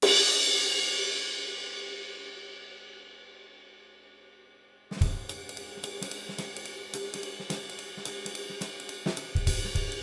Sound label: k dark ride